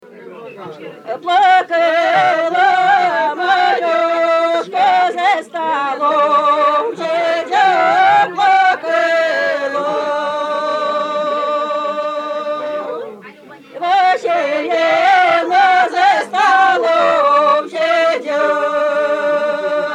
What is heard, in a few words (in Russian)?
Поозерье свадьба Плавала утица по синему морю; Фольклорный фестиваль имени Ольги Сергеевой; Музыка руского Поозерья